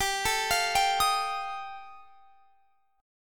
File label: G 7th Suspended 2nd Sharp 5th G chord